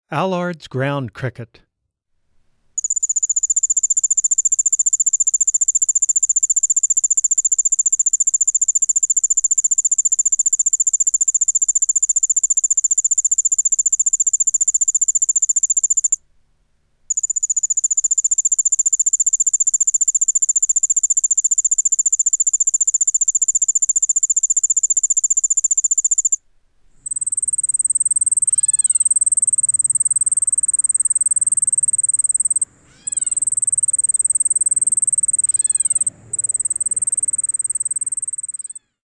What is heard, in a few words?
Allard's Ground Cricket, Wildlife Sounds